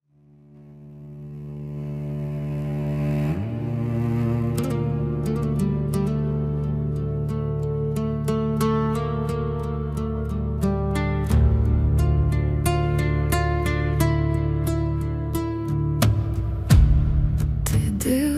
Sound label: ukrainian folk remix